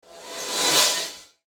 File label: Transition Door Open Air Pressure; Multimedia; Blastwave